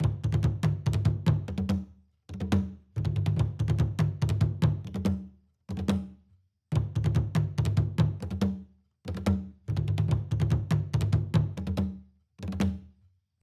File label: Drums; For Honor